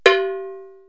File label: Bong!, comedy accents